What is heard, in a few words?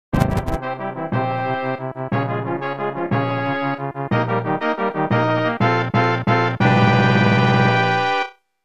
castle, music; marioroyale; allstarssmb